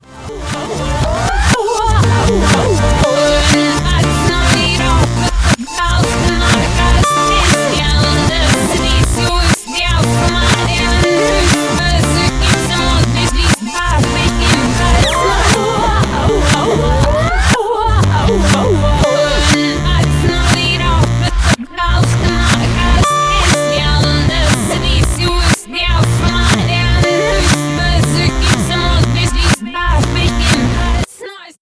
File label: song reverse